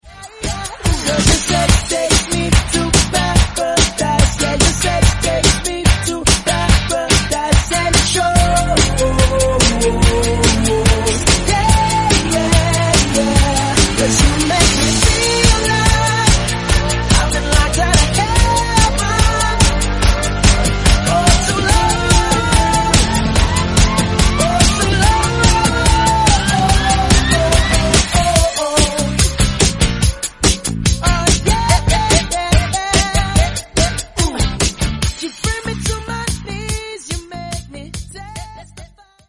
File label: REDRUM 144bpm F